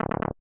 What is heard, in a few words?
Hit